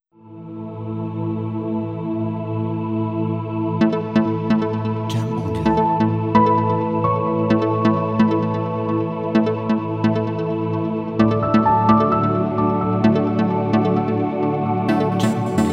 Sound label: Ambient Tech Corporate